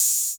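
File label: 808 open hi-hat, TR-808; Crypton - DrumBase5000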